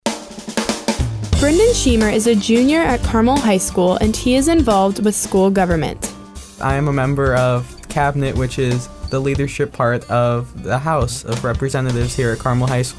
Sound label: CABINET BEAT